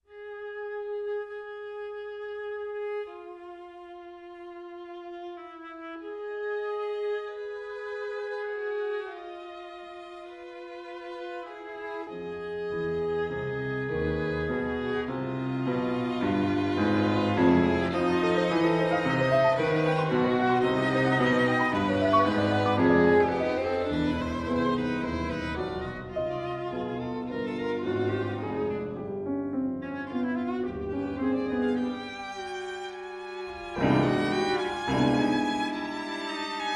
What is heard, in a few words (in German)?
Klaviertrio Violine, Viola & Klavier; Sylvia Reichardt - Violine, Eberhard Klemmstein - Viola, Wolfgang Bauer-Schmidt - Klavier